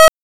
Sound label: Missile Fired; spacefortress